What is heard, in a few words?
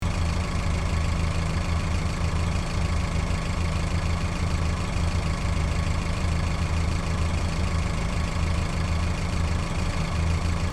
Car engine motor running idling loopable